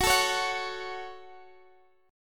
Db Suspended 4th Sharp 5th

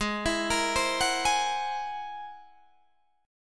G sharp Augmented 9th G sharp Guitar Open D

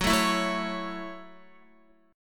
F sharp Minor 7th F sharp, Guitar; strummed; JGuitar

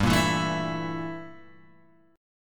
G Minor 6th Add 9th G down Guitar Open G

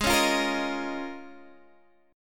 C sharp 6th Add 9th G sharp

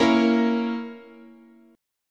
Bb Diminished, chord

